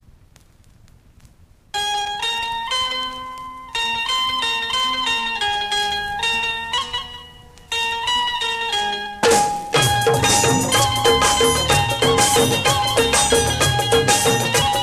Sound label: Ding Don Ding